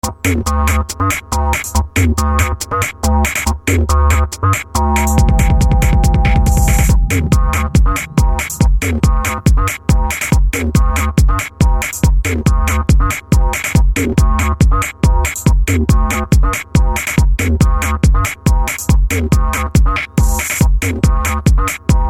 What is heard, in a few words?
techno